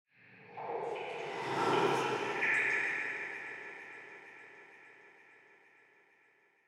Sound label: Ghost passing ethereal movement